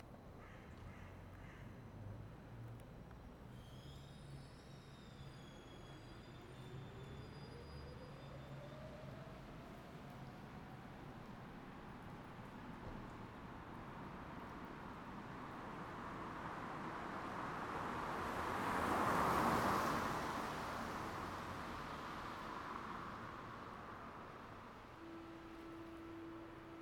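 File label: Berlin Street Ambience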